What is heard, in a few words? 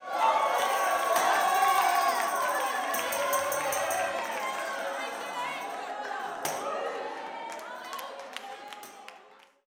160 TAMB, Blues Soul; Spectrasonics; Vocal Planet